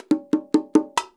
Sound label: 132, Percussion works, LOOPS; Sampleheads; NYC Percussionworks; 90 s Sample CDs